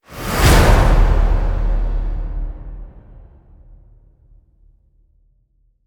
Reverberate Hit Swell; Ninja Tracks

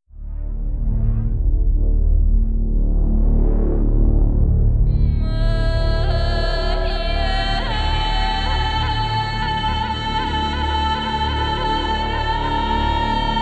Desert Voice, Cinematic Contemporary Action; Liquid Cinema

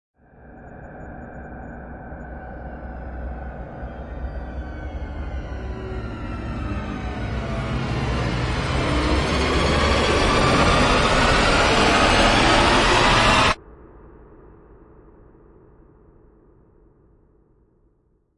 Airlock Rise; Gothic Storm Music

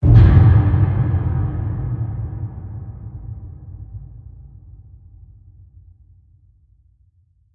Hit Deep; Ninja Tracks

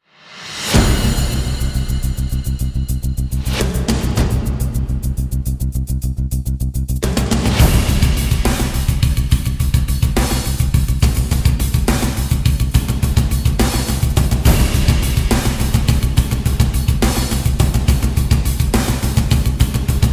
Drums Only Version

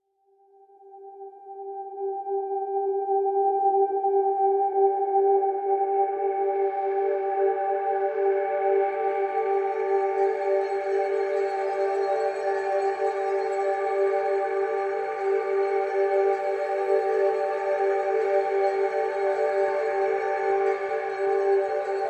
Eastern Province (G); Brand X Music; Brand X Music Catalogue - Editor's Essentials

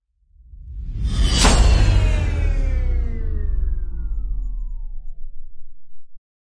Hit Downer; Ninja Tracks

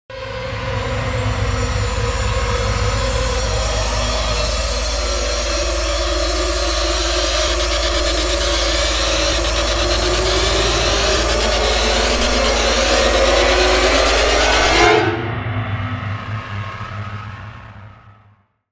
Laceration Rise Only; Ninja Tracks

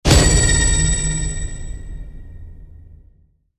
Ringing Hit Metal; Ninja Tracks

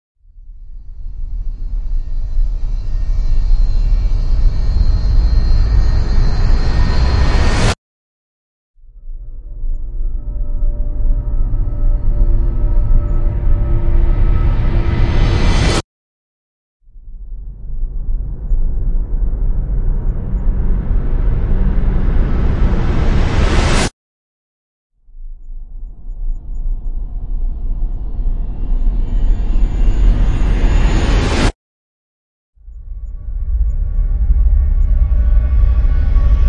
Power Booms Reversed; Epic Tools vol. 1

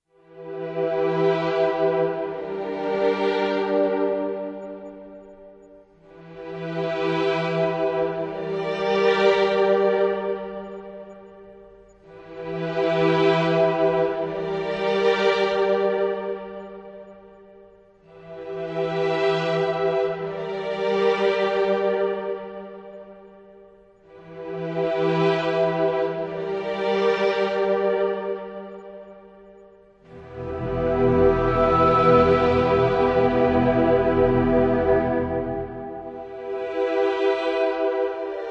Hesitation Ambient

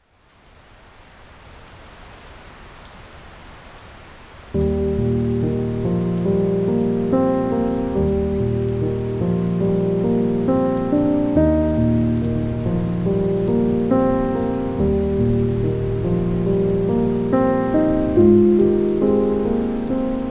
Thunder in the Rain, Piano & Rain

